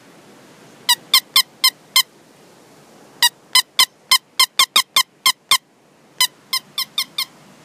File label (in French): Pouet pouet